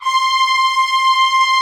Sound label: ORCH C4 FF, Orchestra; Sound On Sound; Peter Siedleczek's Orchestra